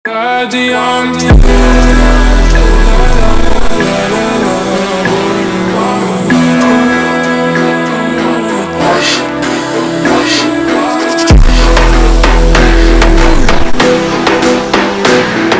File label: Funk Unico super Slowed